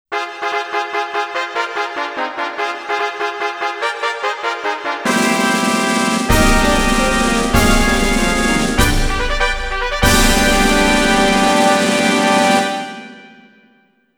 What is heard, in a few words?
Grade Race Fanfare; Tokyo - Nakayama